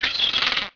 seagull; Ambient; MX vs ATV Unleashed

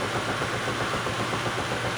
bowling machine; Soldier Of Fortune II Double Helix; XBOX; finca

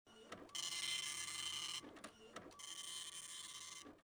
printer; Soldier Of Fortune II Double Helix; XBOX; ambience; nyc

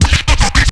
hud special; Tony Hawk's Project 8; XBOX

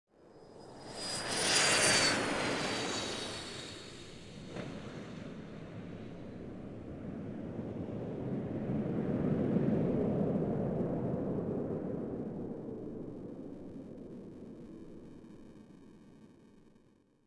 plane pass, airport; Soldier Of Fortune II Double Helix; XBOX; ambience

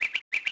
Bird; Cars; XBOX; Ambient

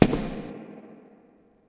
step echo; people; Pirates Of The Caribbean

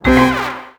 Hit

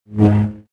saber hup; weapons

